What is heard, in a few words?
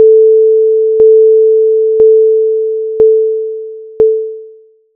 synth test